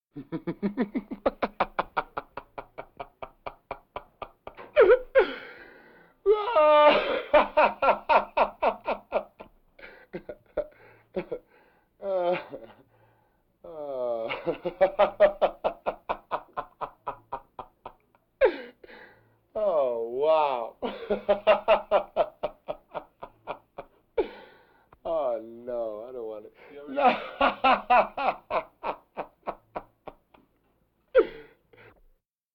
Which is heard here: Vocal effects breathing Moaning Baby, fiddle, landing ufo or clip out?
Vocal effects breathing Moaning Baby